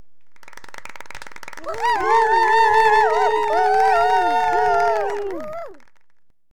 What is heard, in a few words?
Cheer